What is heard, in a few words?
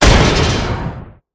Metal Dmg; Ether